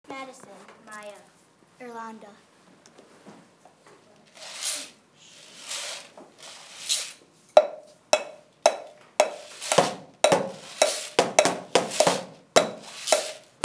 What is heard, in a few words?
found instruments